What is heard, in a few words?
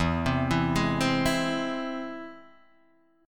E Augmented; E+ arpeggiated; JGuitar